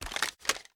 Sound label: inspect; reloads; gmod